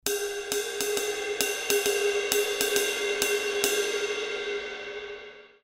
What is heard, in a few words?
K Con Med Thin Ride Low Ride Pattern, cymbal